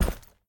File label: mvt footstep; smite; gilgamesh